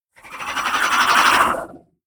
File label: biter call; factorio; Factorio 0.14.21; creatures